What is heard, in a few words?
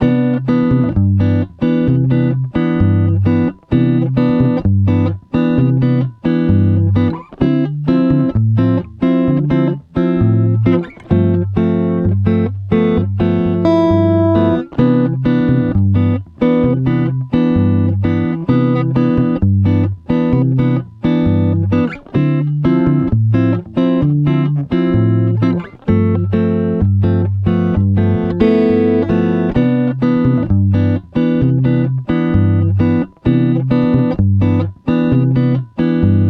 key of c play a long 130bpm, guitar; intermediate guitar